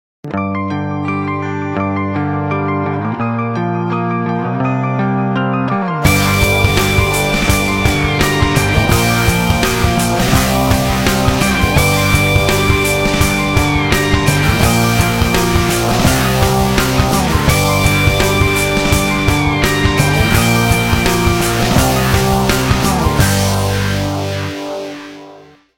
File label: Rock, Ringtones, Nokia; 5200